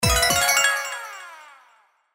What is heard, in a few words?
Win Wild; Thanksgiving Blast